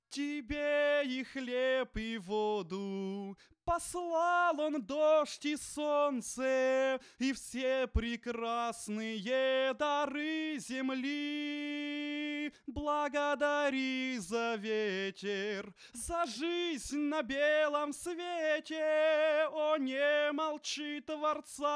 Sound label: vocal